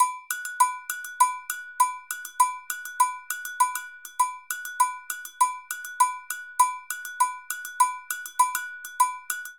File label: Plena Agogo 100bpm; Latin Percussion; Ableton; Factory Packs